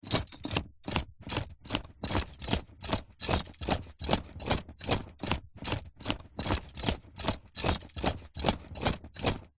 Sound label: horse riding saddle movements just saddle running leather jingling of met; animals